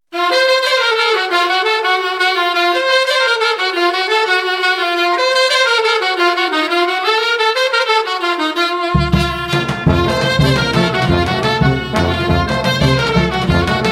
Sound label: instrumental